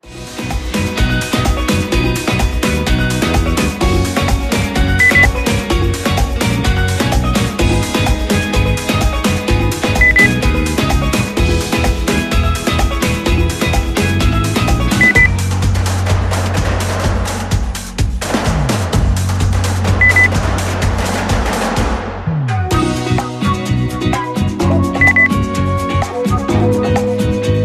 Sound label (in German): beep; urlaub